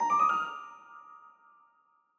click fx tonal; soundscrate

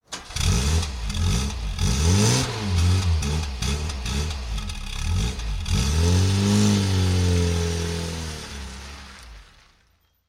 car engine rev

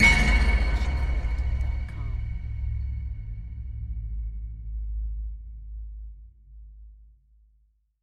cinematic hit metallic; soundscrate

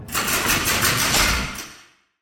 jail door slow; soundscrate

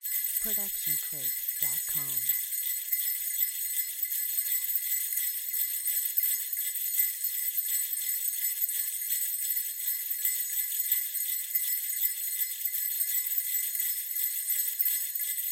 christmas tree bells; soundscrate

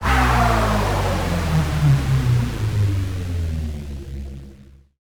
Bass Drop; SoundsCrate

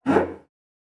Cello Psycho Screech; SoundsCrate

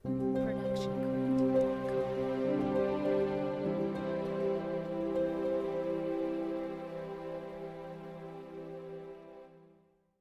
Sweet Ident; SoundsCrate

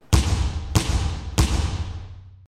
basketball big indoor dribble loopable

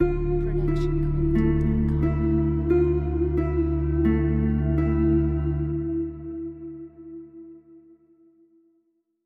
Mystery Harp; soundscrate

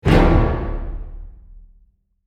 low strings piano hit

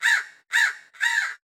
crows caw; soundscrate

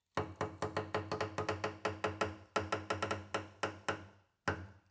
Cello Scratchy Noises; SoundsCrate

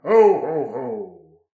ho ho ho; soundscrate

